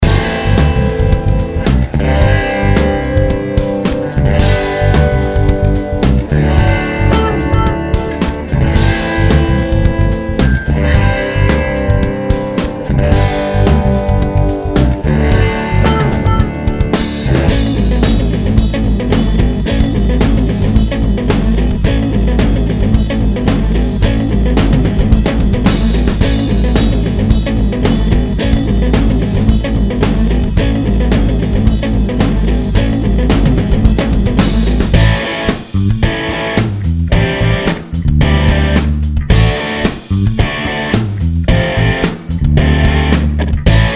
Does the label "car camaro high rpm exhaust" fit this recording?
No